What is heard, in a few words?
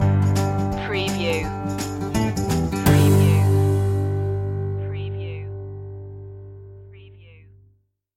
easy pickings stinger